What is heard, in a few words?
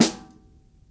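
snare drum, acoustic drum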